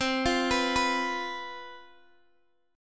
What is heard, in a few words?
C Major 7th Suspended 4th, down, Guitar, Open D